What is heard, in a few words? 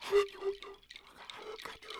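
Music Village Calm Near; From Dust; PS3; Xbox 360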